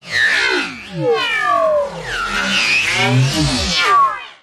science fiction robot transform metallic mechanical